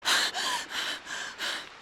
in wardrobe gasping

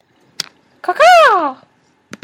cucaw

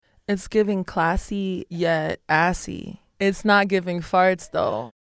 no farts

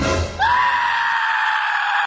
scream in fear; smg4

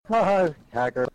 haha; hacker

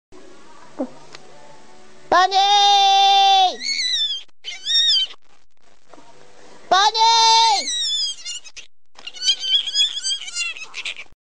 cute screaming bunny

